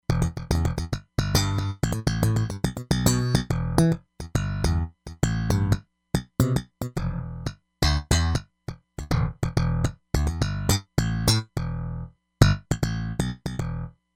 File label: Slap Bass, Roland Fantom XR